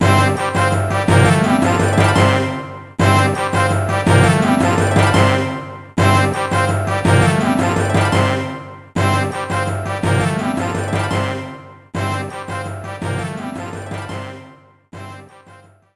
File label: Jingle ~ Level Completed; Adventures of Lomax